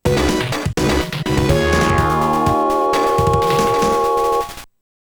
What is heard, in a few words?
Miniboss Defeated!